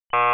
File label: buzzer